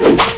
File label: digital plasma shot